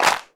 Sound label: hand clap sample E key, hand clap tuned to the E note. Loudest frequency: 2263Hz; single hits one shots